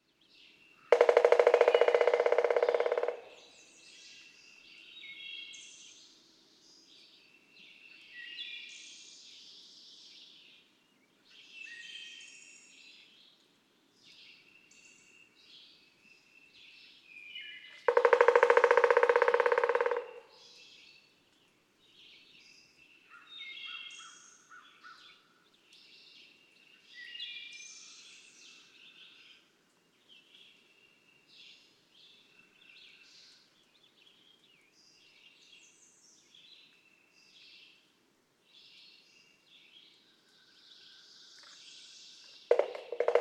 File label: Pileated Woodpecker, Four drum rolls.; May 15, 2004. Breaks Interstate Park, Breaks, Virginia.